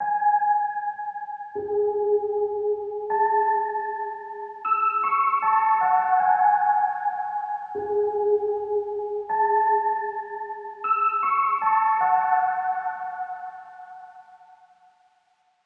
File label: 155 BPM G# Min Juno-60 Pluck; Loops; Loop Stems; Cymatics; Fantasy Synth Sample Pack; Fantasy - Purple